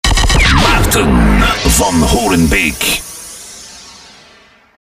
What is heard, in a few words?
name jingle